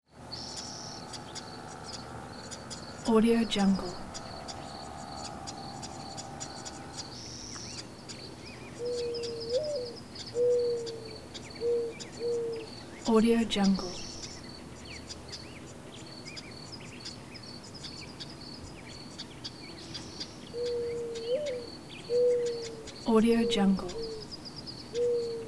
desert dusk crickets chirping birds tweeting; prosoundfx

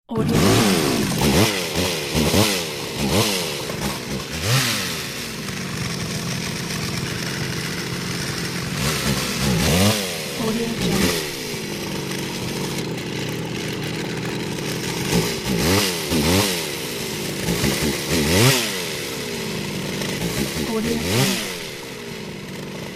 chainsaw; alexnaobum

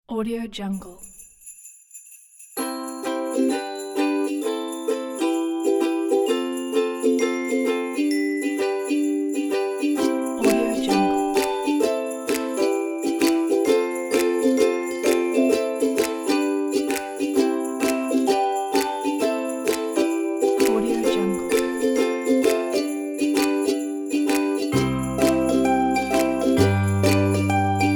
happy christmas ukulele

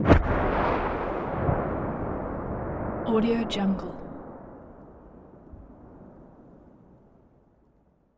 blasts various; matter mayhem; soundmorph

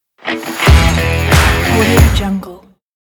short rock logo

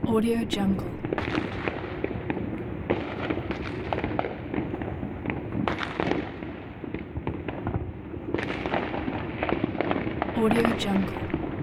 distant fireworks; eternal-future-productions

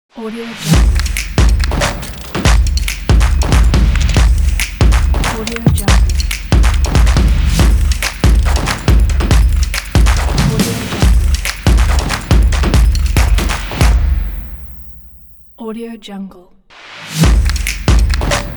action sport claps drums

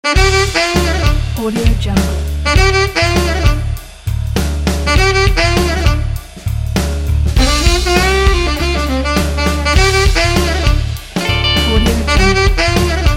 sax machine

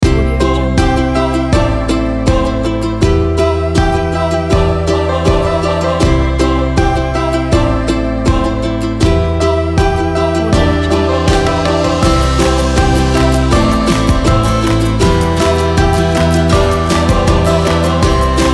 happy acoustic folk

